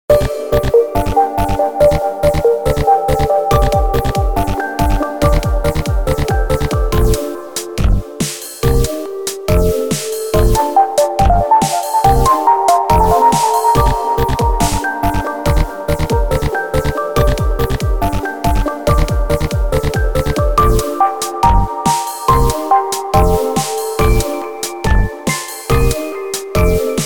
bipolar beats

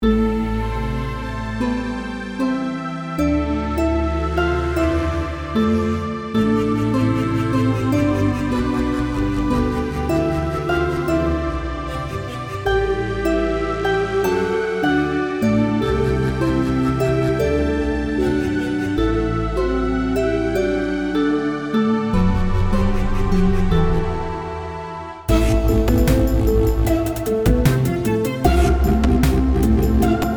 predynastic dawn

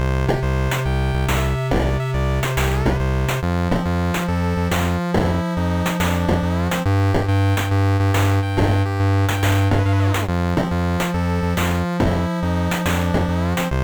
Happy Chip-tune

